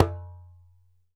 ASHIKO, African Drum

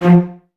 Cellos F 3; Miroslav Vitous; String Ensembles; LINKS ORCH

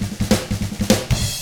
SHUFFLE, Drums

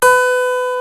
CLAVECIN, HARPSICH

